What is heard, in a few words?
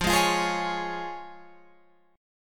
F Minor Sharp 5th, Guitar Open F, chord; Fm#5 strummed; JGuitar